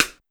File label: Rim, Pitched Up; Clavia DDrum-AT